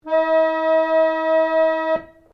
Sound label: ORGAN PUMP CELLO E4 CLUSTER, Old portable church organ; Keyboards; Musical